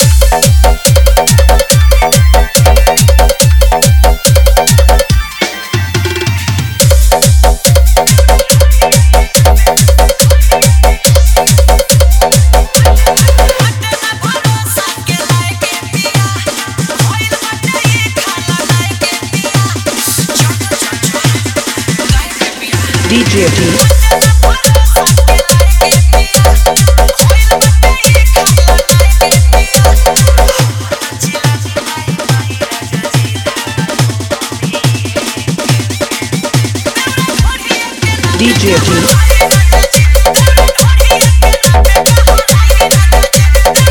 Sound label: BhojPuri Hard Bass Special Dholki Dance Remix